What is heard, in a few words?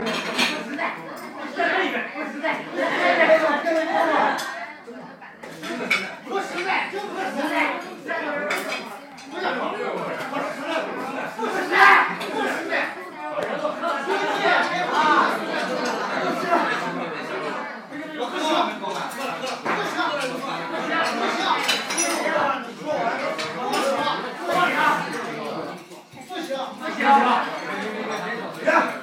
chinese restaurant